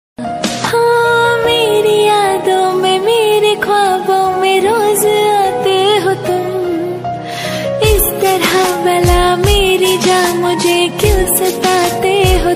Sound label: Female